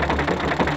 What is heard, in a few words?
vulcan fire